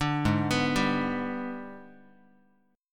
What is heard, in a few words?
G sharp Minor Major 7th Flat 5th, Guitar; arpeggiated; JGuitar